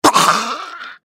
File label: feral ghoul death; npc